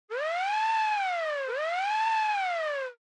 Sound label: Police Car Siren Fake (Slow)